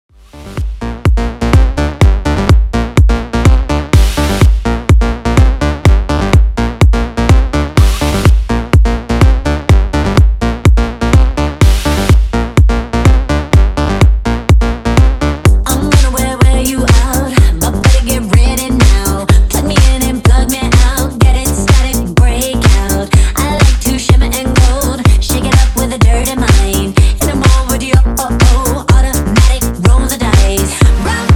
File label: 125 Bpm